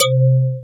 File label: BELLS; Back In Time Records; Big Bang